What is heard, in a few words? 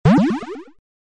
power up bonus